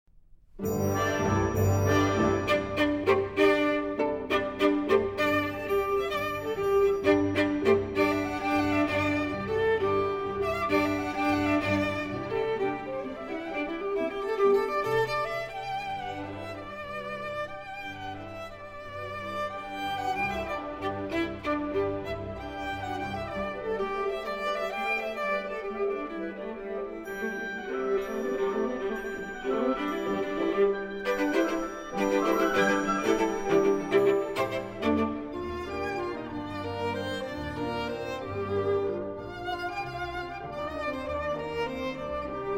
Suite For Viola & Small Orchestra Christmas Dance

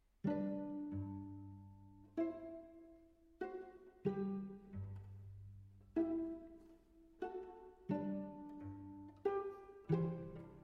String Trio in B Minor II. Romance

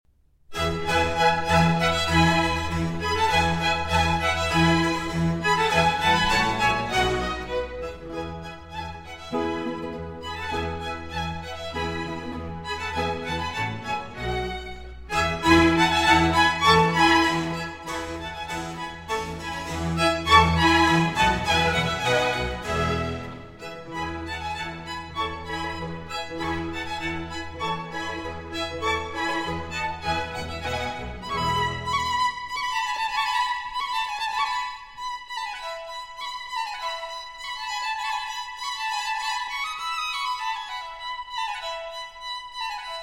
Violin Concerto in E Major, Allegro; The Four Seasons, Violin Concerto No. 1; RV 269 Spring

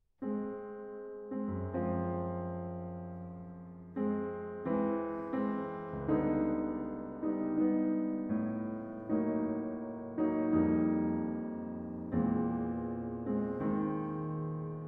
Piano Suite, Marcia funebra. Maestoso; No. 3, AK 73

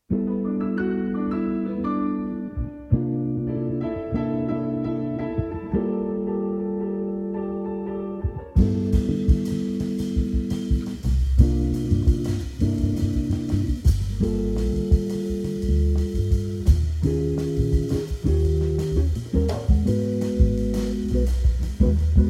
Natural Jazz

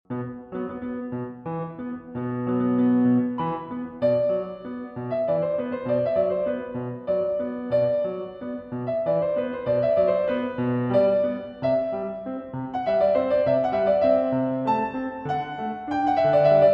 Solo Piano; Thais; Fantasie and Selections (Pt. 1)

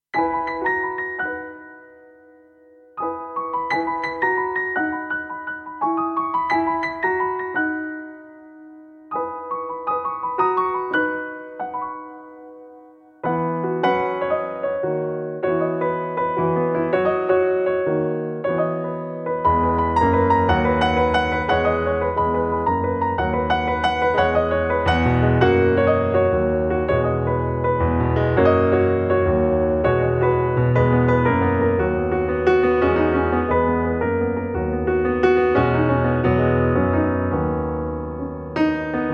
Piano Version

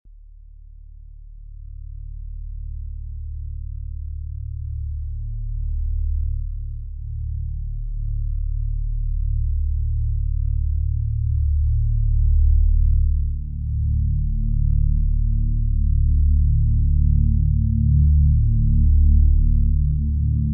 Ambient

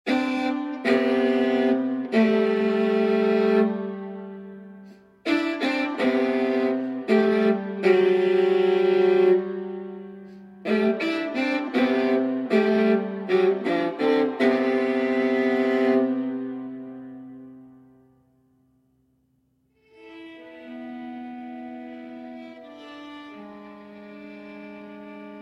Sonata For Viola Solo V. Lamento

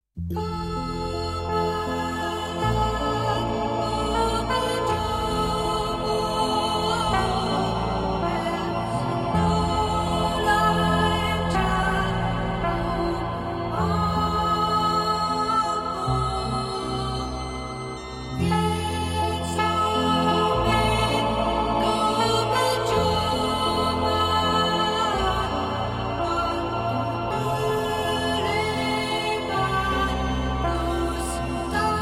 Italian Song

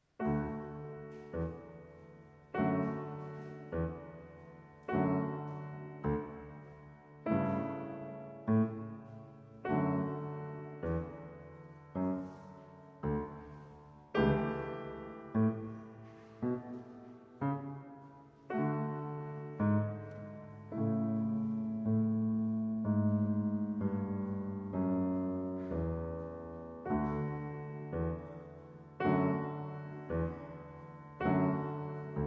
Piano Sonata No. 2 in A Major, Largo Appassionato

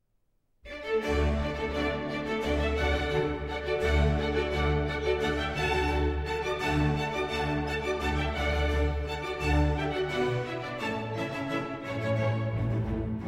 trumpet concerto; Tartini Violin Concerto in E Major, D. 53 I. Allegro moderato (Arr. for Trumpet in D Major)

